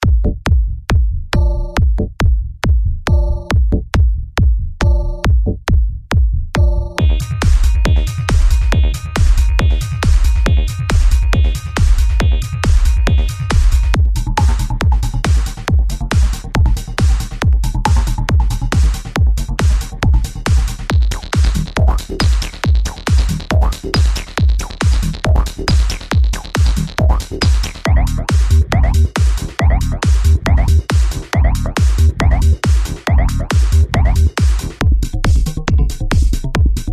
PSYTRANCE

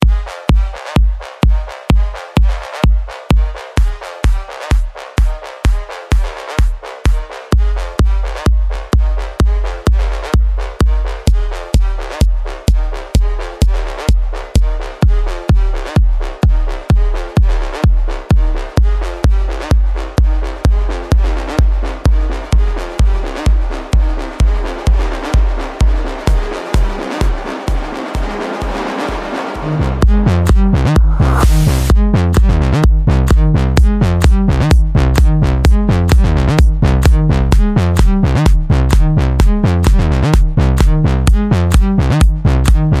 kick presets; AUDIOTENT

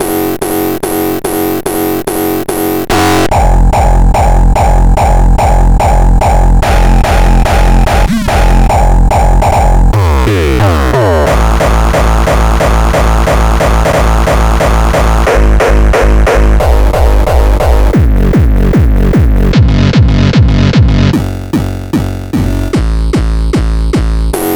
Nu Hardstyle and Hardcore Kicks; Bluezone

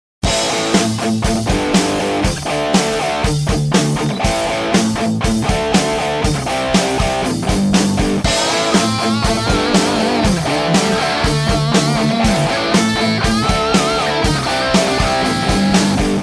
Rock Guitar Attack

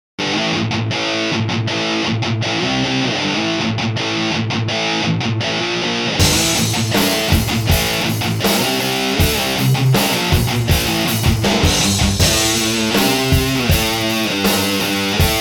Metal Guitar Attack

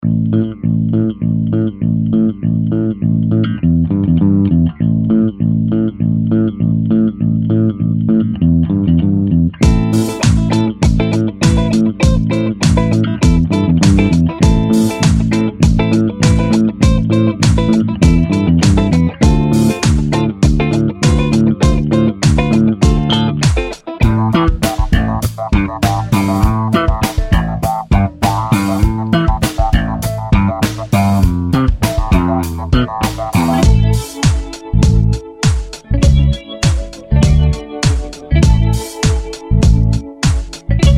Funk Disco Bass

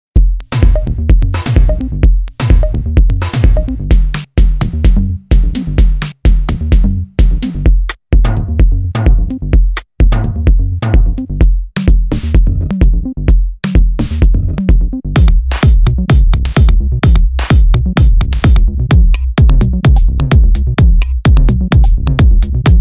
club dance loops

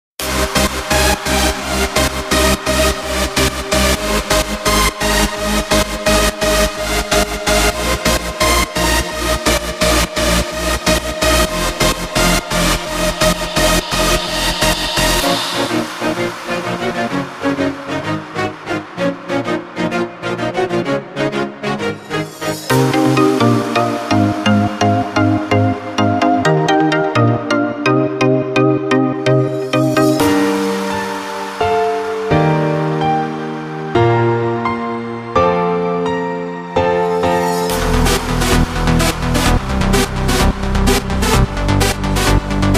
FUTURE ELECTRO FESTIVAL

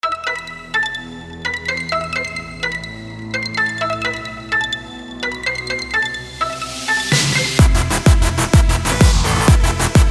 EDM; Woombah

